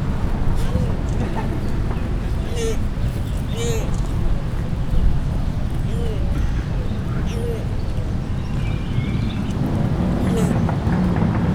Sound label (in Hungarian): láma; Győri Zoo 2014